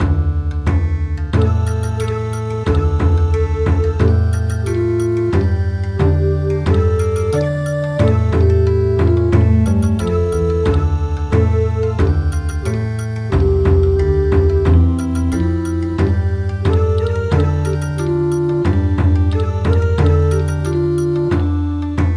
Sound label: Bon Odori, midi traditional